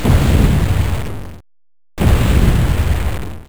Giant Foot Steps; Saban

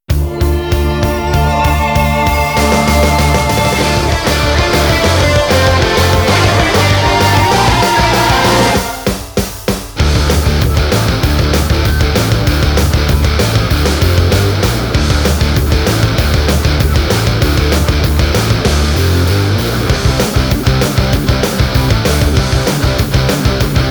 Opening Rock Version Instrumental; Mystic Force

